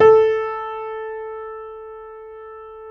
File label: JAZZ A3 F, Keyboards Acoustic; USB Soundscan vol.17